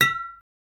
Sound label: Wine glass clink; buoi tiec